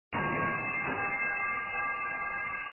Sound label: low quality metal pipe sounding effe